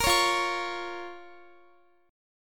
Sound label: F Suspended 4th Sharp 5th F, Guitar; Fsus4#5; strummed; x,x,20,18,17,20